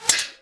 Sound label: arrow arm; DAoC